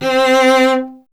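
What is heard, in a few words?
strings cello C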